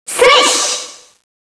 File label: sword; cstrike; Knives; MiraclePrism